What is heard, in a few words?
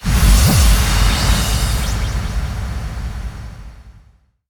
Magic